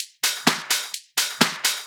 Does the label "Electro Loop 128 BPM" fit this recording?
Yes